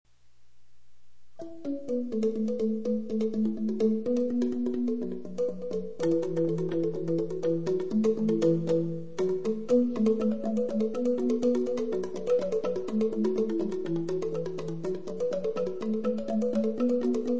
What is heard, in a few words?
rindik